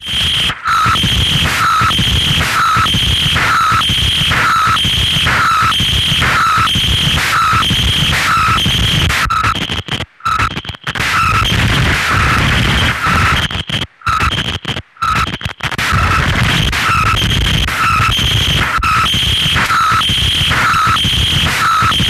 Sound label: dist after, effect pedals; audible Disease Convulsion CN-1